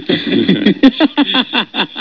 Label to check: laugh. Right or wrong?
Right